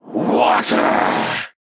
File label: Principal Water; AudioWizards